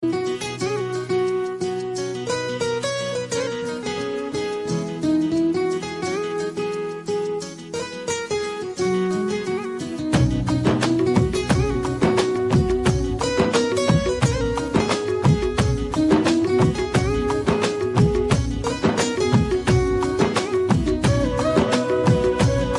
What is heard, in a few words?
Ringtone Instrumental